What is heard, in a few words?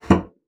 bassoon slap; Xsample VOL-6; C BASSOON